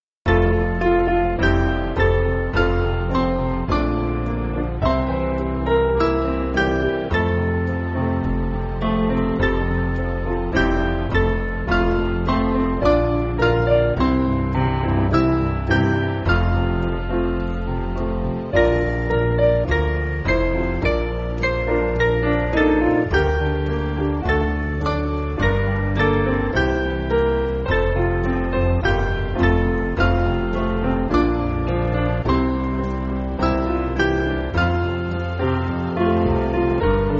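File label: Piano, church backing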